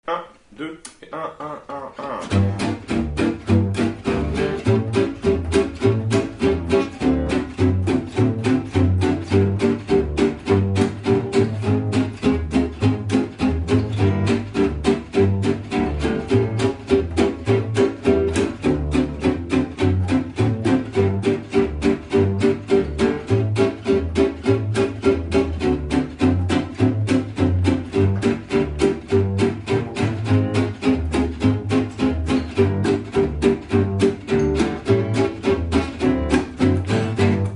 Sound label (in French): swing gitan